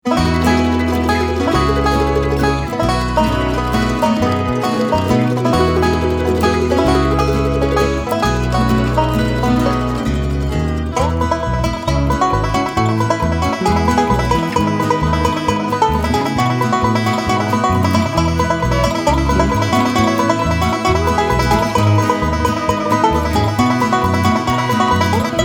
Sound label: Banjo